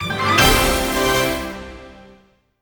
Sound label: Amiibo Scan Fanfare; Super Smash Bros. Anthology Vol. 37 Fanfares